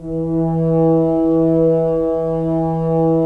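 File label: Solo French Horn; Roland - LA Composer vol. 4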